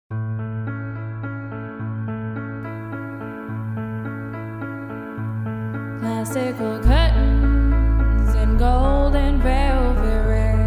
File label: Classical